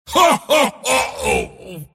attack pain; Mars

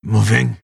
move pain; pangolin

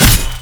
Axe attack

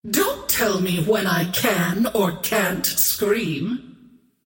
scream whiff; Dota 2; queenofpain

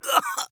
Demon Skin Death

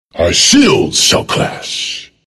attack; dragon knight

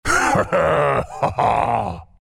laugh, zh; Husk

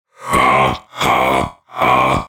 Laugh; Paladins; Makoa

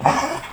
alert; Frost Avalanche Pup

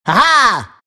laugh; shredder

